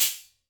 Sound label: CYM; E-MU Producer Series Vol. 5; 3-D Audio Collection; 3D Multis; 3D Sprint Select 2